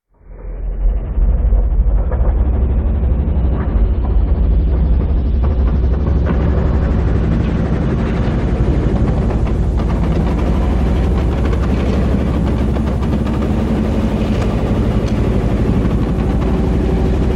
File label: Inside Damaged Chopper